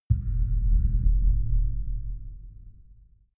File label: far outer space shadow, hits impacts